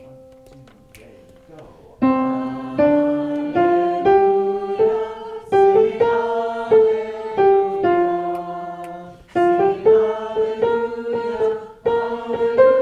Calypso Alleluia Parts

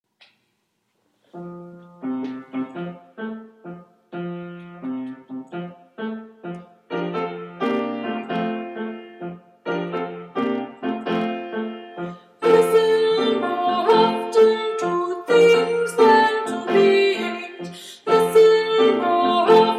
Breaths Soprano Piano

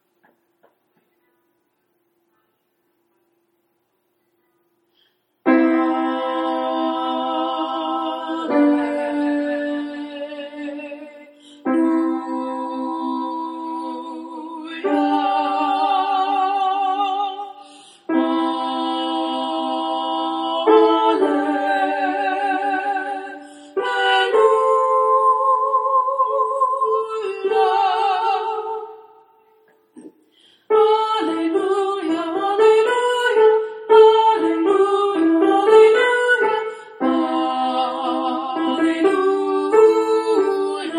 Alleluia in 6/4 Alto & Soprano

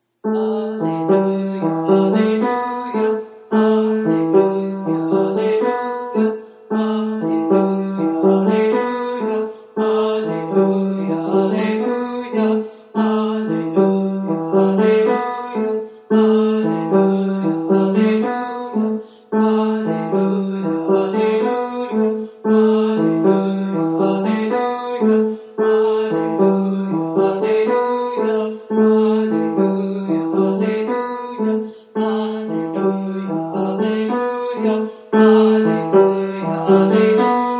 Alleluia in 6 4 Tenor & Bass

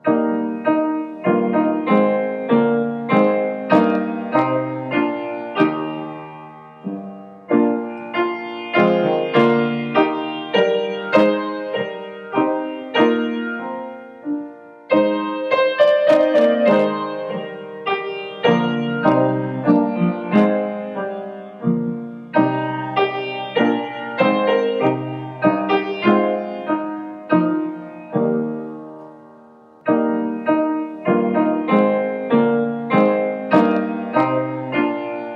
Be Thou My Vision Piano Only

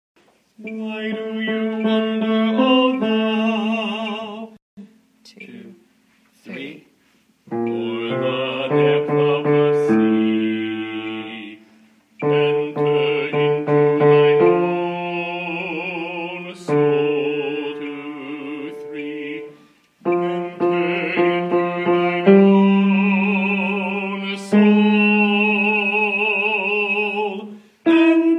Why Do You Wonder Bass

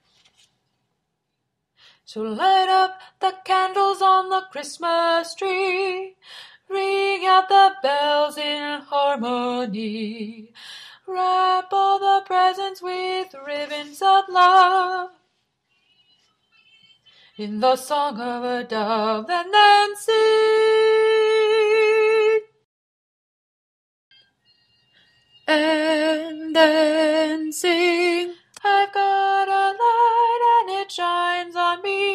Spirit of the Christmas Tree Alto

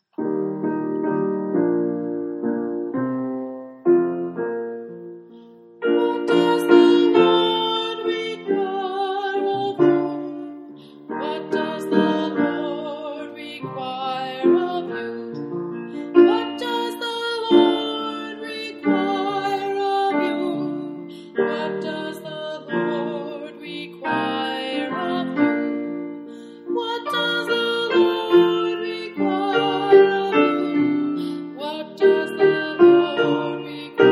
What does the lord require of you Bass

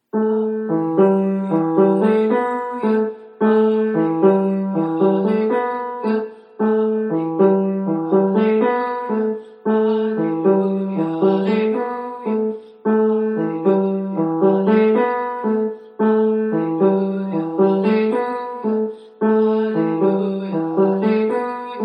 Alleluia in 6 4 Bass

